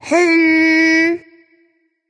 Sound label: tongue; smite; artio